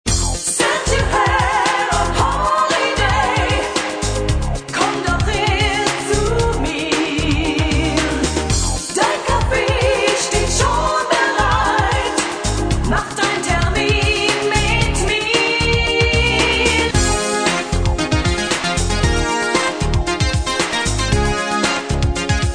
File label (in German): Friseur Musik gesungen send your hair on holiday